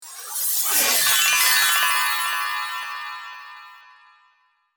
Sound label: Magic wand sparkle